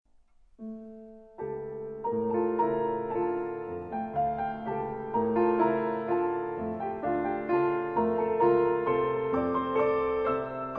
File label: waltz